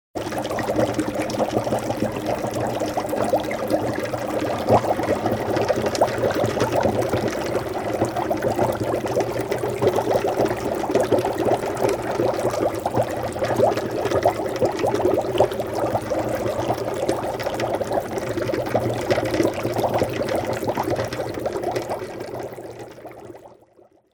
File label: Bubbling water; SOUND EFFECTS1